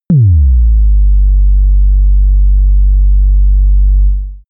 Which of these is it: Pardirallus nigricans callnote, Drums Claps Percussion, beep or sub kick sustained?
sub kick sustained